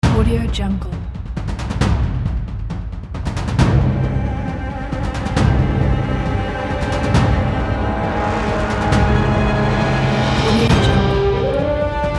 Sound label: dramatic action